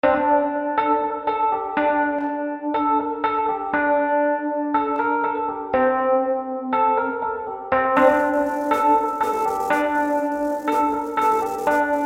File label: Piano Interlude